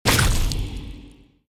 Death Burst Large; The Binding of Isaac

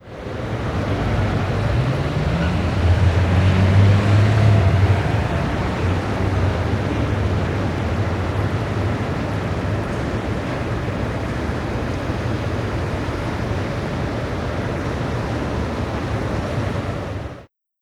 Tokyo Amb

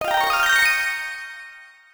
Magic; Manzai; Puyo Puyo Puzzle Pop; iOS, macOS, mobile

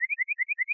Incorrect

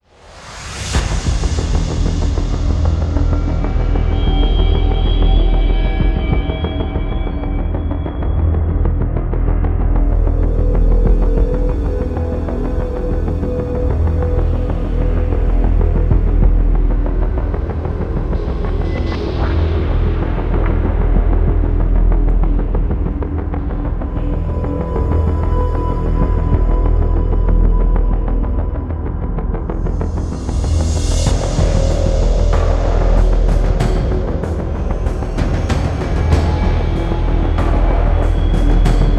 Suspense Ambient

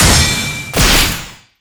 Enemy Temple Sword Shield Cross

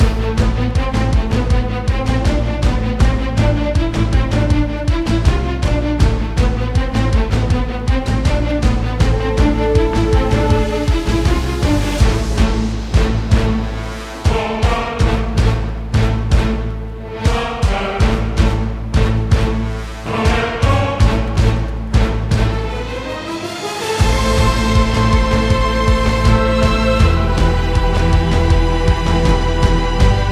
Fight Standard 2; Vendir Plague of Lies; Android

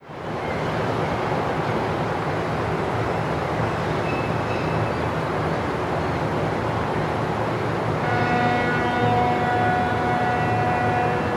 Golden gate bridge Harbour

